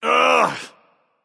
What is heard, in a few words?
ugh; Smite; Horus